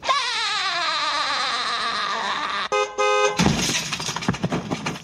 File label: car crash